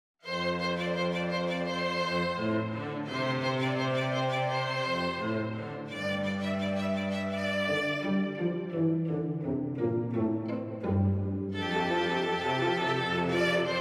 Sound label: String Trio; The Jolly Postman